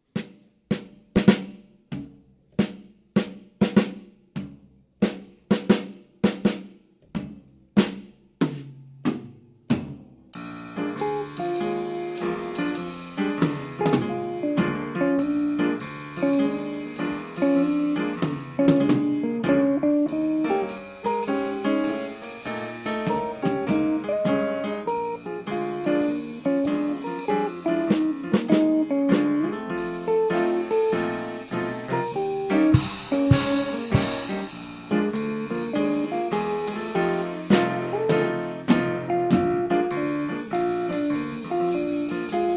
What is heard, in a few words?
Blues